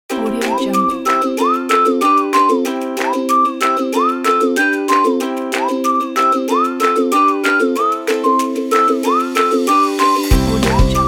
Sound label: upbeat whistle and ukulele